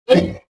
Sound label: choke